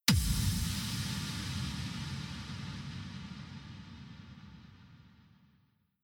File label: Future Bass FX Hit